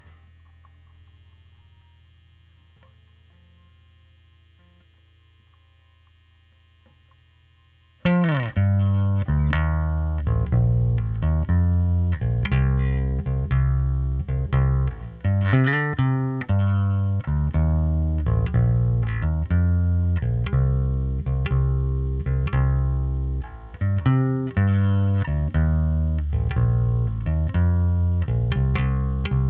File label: Bass OD